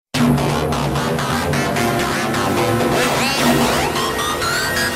Text Tone; Tekken

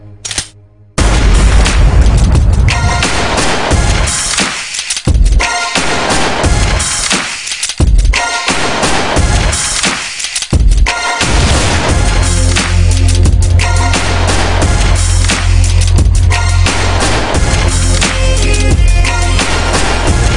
black beat, hip hop

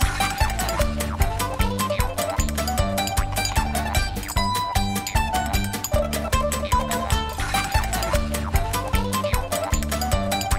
banjo

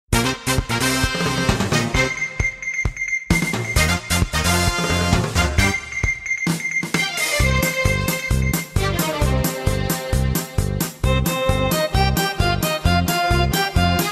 BGM; VOLTES V

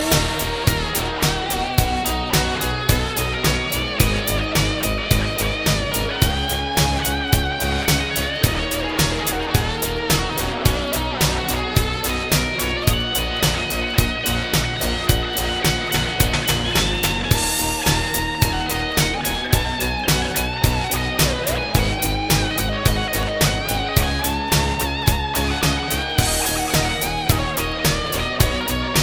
E Guitar Ballad

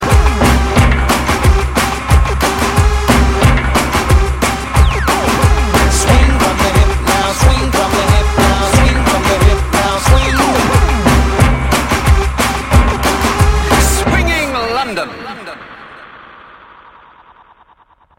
Is Calling Pop

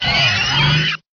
Dinasaur Sound

